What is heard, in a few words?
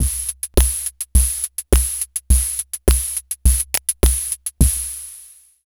Pocket 104, Lounge Drumz